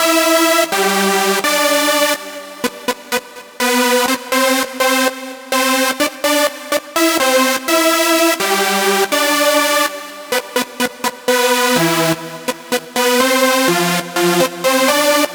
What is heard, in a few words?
Synth